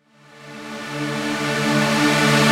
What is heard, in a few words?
Reverse Synths D, Effects; Vengeance - Essential Clubsounds Vol.4